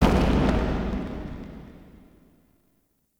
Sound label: Crypt Distant Stone Texture C; Ambience; One Offs; Champion Crypt